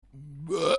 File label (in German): ruelpser